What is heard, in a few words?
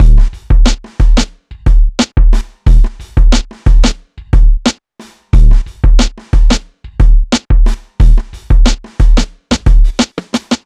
skoop 090bpm, Downbeat Dance Grooves; Zero-G